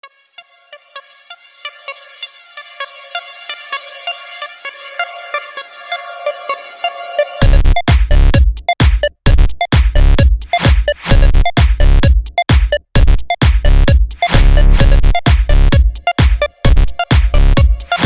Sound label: the hard electro bass